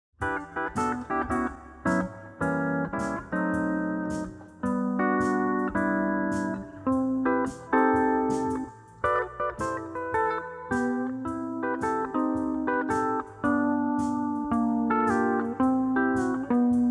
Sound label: Steel